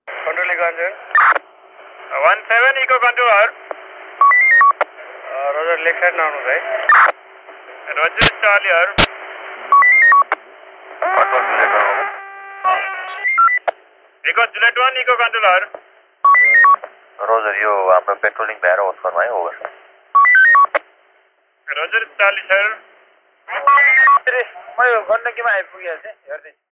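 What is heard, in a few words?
Tamil Nadu Police Walkie Talkie Ringtone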